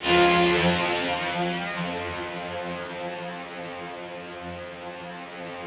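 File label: rough strings F1 right, Yamaha VP-1; 90 s Sample CDs; Music Store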